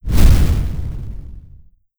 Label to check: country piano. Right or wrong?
Wrong